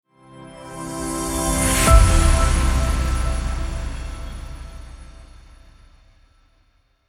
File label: Logo Sting Reveal Short